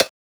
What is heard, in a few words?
PERC